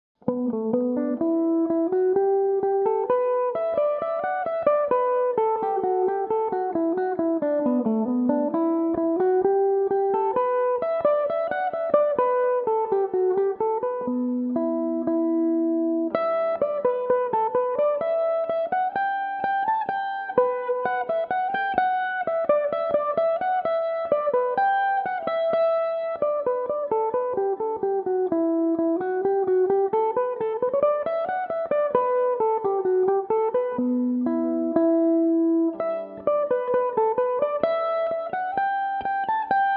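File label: E minor, Irish mandolin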